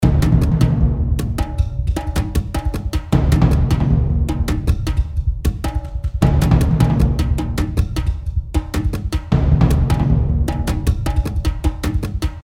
action drums middle

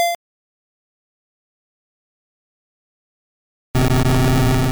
Egg Bomb; attack moves; pokemon; gen 2; gsc

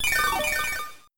Milk Drink; Pokemon; Gen 3; attack moves; RSE

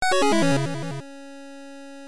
Failure Jingle; pop'n music GB

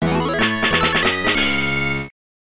Won Medals; Dam Dam Boy; arcade

